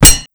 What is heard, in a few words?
repair; sentry